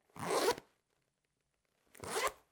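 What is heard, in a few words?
zipper fastener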